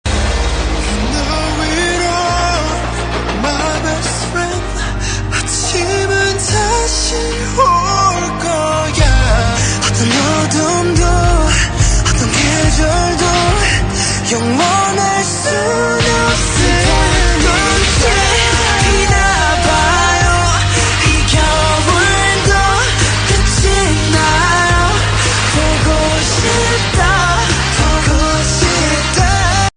korean ringtone

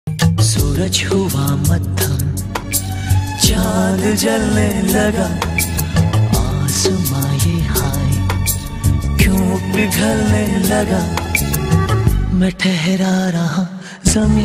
male hindi ringtone

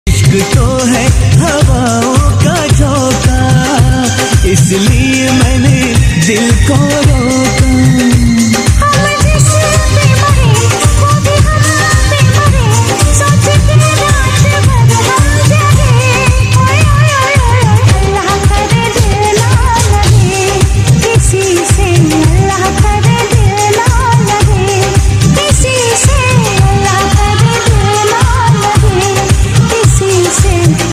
hindi ringtone

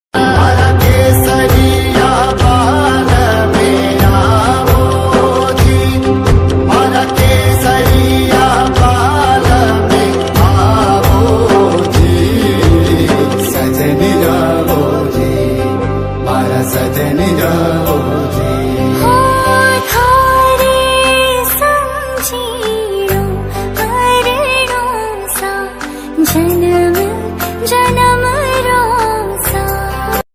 gujarati ringtone

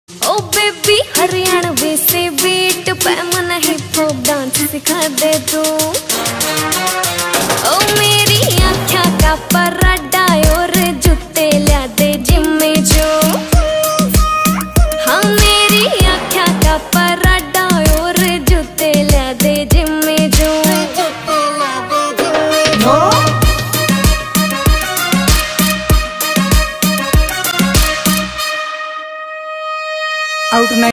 Haryanvi Beat haryanvi ringtone

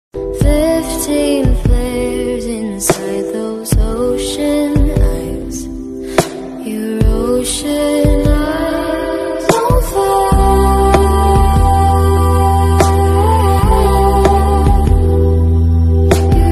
english ringtone

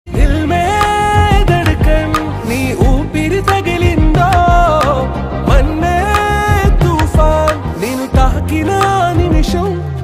Telugu ringtone

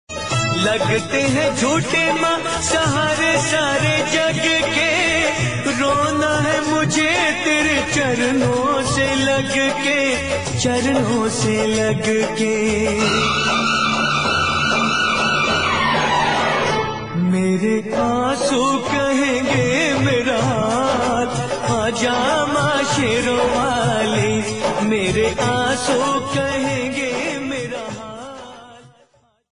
hindi devotional ringtone

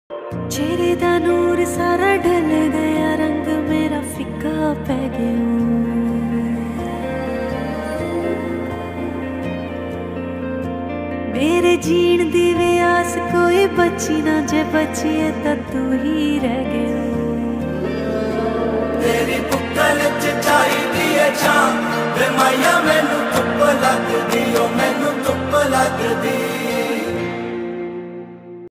punjabi ringtone

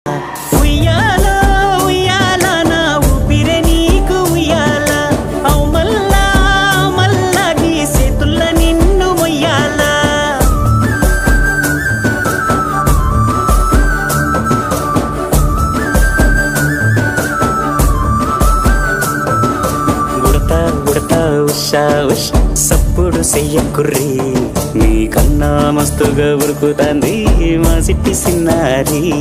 telugu ringtone

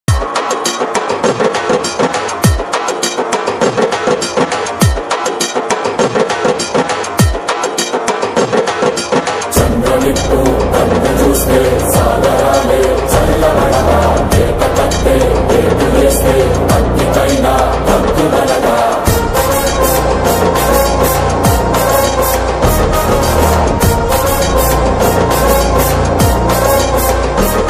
BGM ringtone

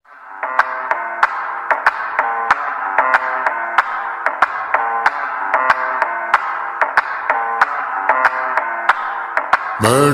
lofi remix ringtone

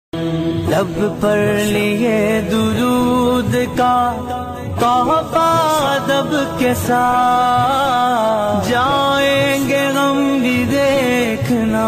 islamic ringtone; kaaba

